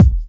dope bass drum one shot e key, Royality free kick drum sample tuned to the E note; Loudest frequency: 176Hz